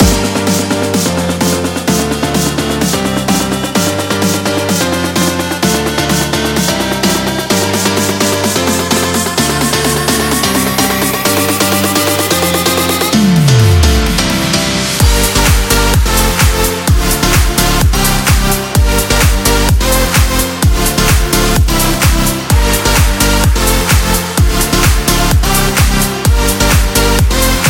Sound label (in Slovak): hudba; videa do aplikacie